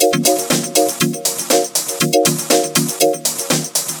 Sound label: Electro, ringtones; Ether Shake; Google; NewWave Labs